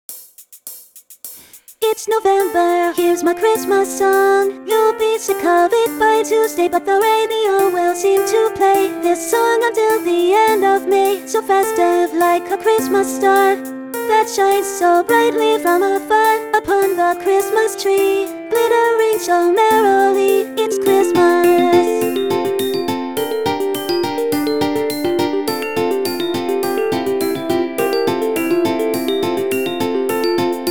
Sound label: Recorded Sleighbells; NewJayTracks